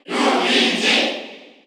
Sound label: Cheer Korean; Luigi; SSBU